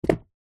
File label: household jewelry box set down on carpet; zapsplat